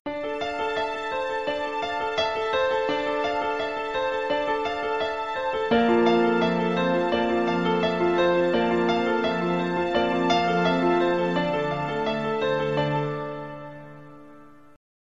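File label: dramatic transition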